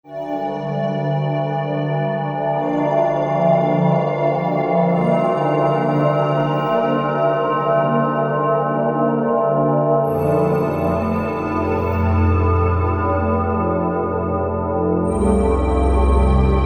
SYNTH TEXTURES Atmosphere; Gorai Atmosphere; Apple GarageBand 2

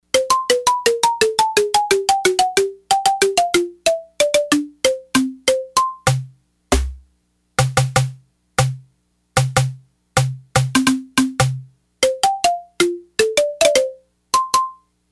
Xylosnare, Yamaha DX100; Factory Patches

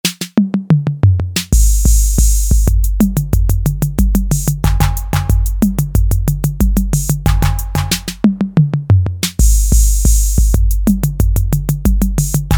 Fill in, Roland TR-808; Factory

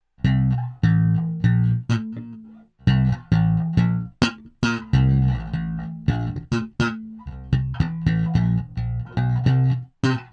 SLAP

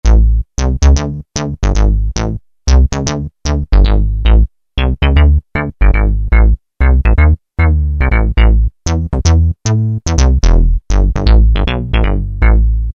Bass, E-mu Morpheus; Factory Presets

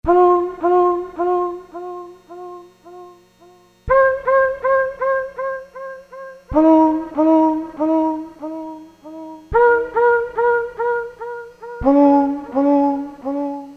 human voice, Casio SK-1; Factory sound

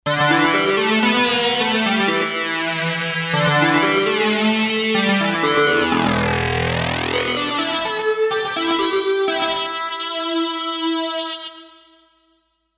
Da Lead, Kawai K5000S

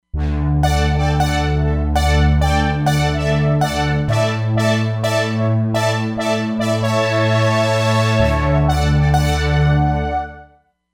Fat Synth Brass

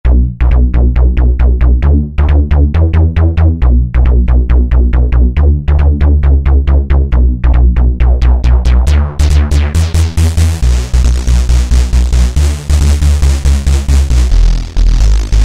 Korg microKORG Short Synth Bass; Factory Voices

